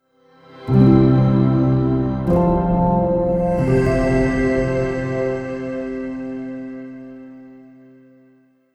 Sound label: Corporate Transition